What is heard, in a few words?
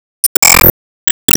Xmas Ball Build